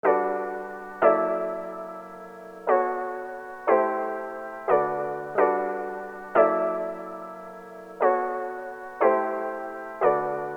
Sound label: LOFI CHORD